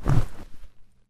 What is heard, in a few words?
z swipe, Zombies; zps; Attack